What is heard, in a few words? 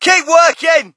crowd, shout; Mike Tyson Heavyweight Boxing